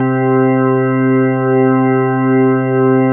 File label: ROCK ORG; Synclavier Sampler Library